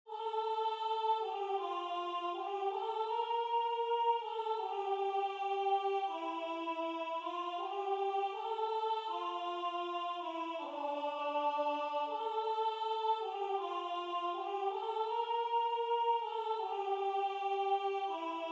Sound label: Alto